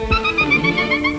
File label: whoop; disney